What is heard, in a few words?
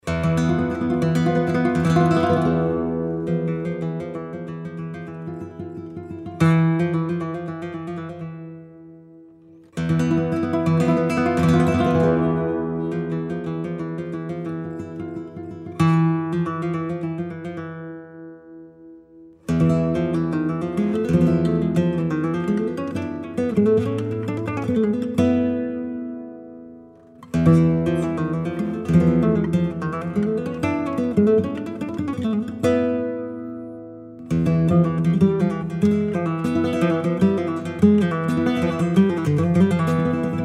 soleares, gitarre flamenco